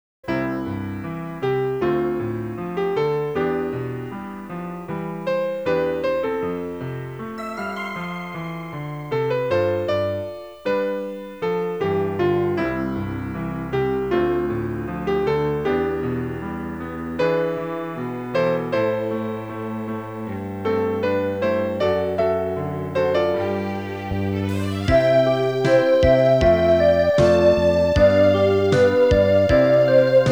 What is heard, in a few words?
Title (Synthesizer Version); Super Famicom Magazine Volume 12